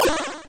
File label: eat Liz; Dino Run